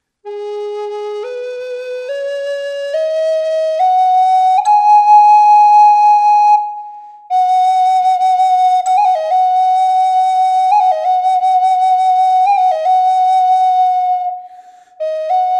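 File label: reverb, flute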